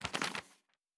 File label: Page, Fantasy Interface Sounds